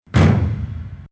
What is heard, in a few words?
taiko